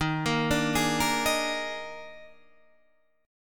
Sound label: D sharp Major 7th Suspended 4th, D sharp